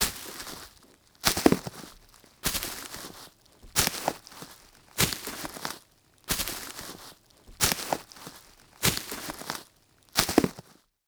Foley Footstep Work Boots Leaves And Twigs Debris Walking; Foley Footsteps